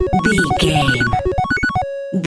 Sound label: retro beeps success